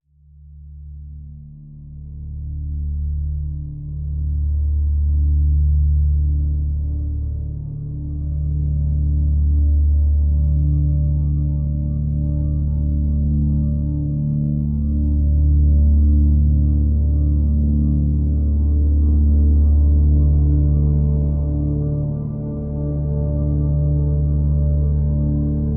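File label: drone D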